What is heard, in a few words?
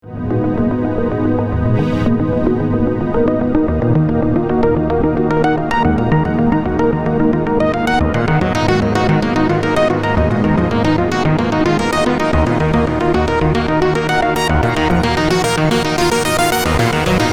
Prophet 5 Arp High Input Gain; ATTACK1 RELEASE1 12-1 SHPF60Hz 100Wet